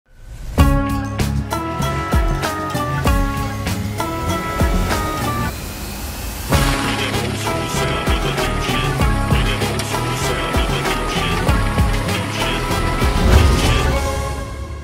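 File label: Ringtone background music Music; Farzi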